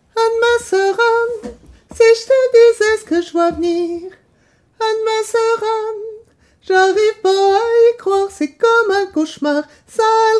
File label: basse